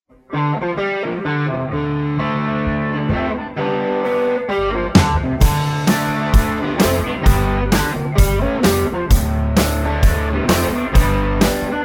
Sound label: Karaoke Song